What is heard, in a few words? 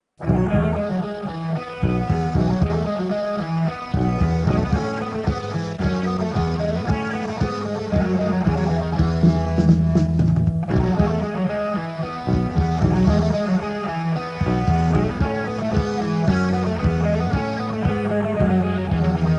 Catch - Instrumental